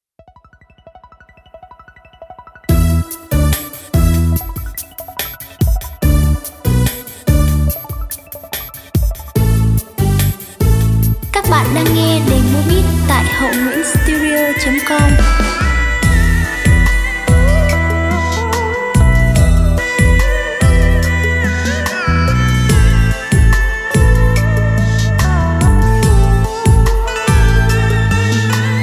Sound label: Lk beat, playback